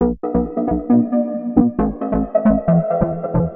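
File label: Bpm135 Seq Loop A major, Dubstep Chord Loops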